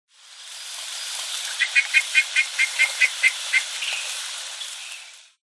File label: Boophis axelmeyeri